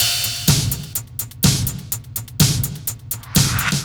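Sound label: Metal No bass drum, Drum Loops 125bpm, Nu Dance Electro; Zero-G; Soundclash Nu Dance Electro; Acidized Wavs; Tommy Metal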